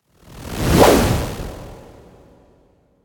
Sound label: Justice, Trailer Whooshes; Federico Soler Fernández; Effective Trailer Whooshes